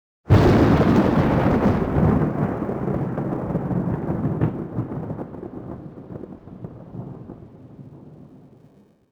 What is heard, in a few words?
thunderstrike; Soldier Of Fortune II Double Helix; XBOX; ambience